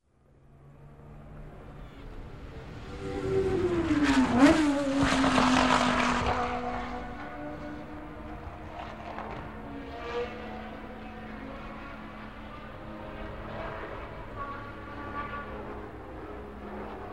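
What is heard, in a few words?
formula-1 cars racing past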